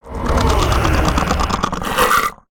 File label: punce; creatures organic